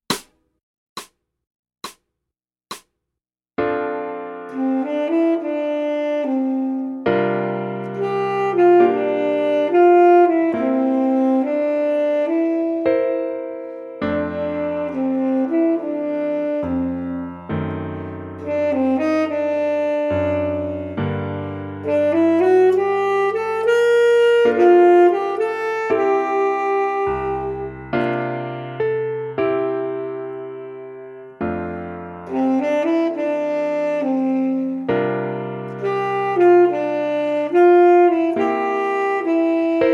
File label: Sax T Jazz